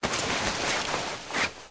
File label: struggle; CosmicRage; wear